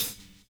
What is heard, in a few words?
HH, drums dance & groove; USB Soundscan vol.11; AKAI